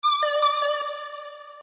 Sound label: Weow Weow